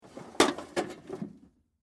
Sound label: Tool Box Tray, Industry, Tool, Box, Inner, Tray, Slide, Up, Metal, Case, Antique; Hand Tool Sound Effects; SoundEffects-BlastWave